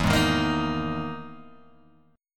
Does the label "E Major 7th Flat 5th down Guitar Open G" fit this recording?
Yes